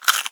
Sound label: eatable generic; JavaGameUebungen